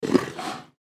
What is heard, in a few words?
Leopard Breathy Growl; Big Cats; Animal